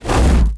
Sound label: flaming Axe swing; weapons